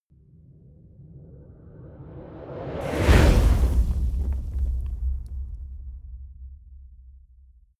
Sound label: whoosh fire torch hit